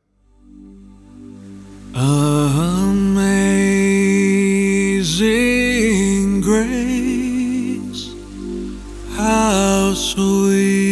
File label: amazing grace acapella